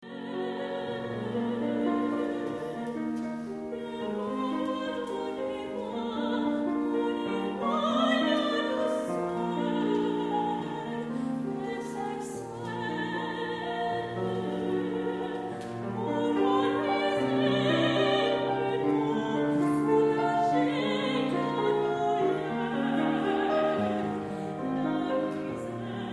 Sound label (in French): en prière